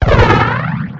raising dragon

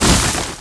angel ice slug die

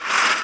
iceslug move; melibe

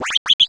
mimic die

